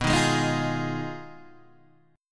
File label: B 6th Flat 5th B down Guitar DADGAD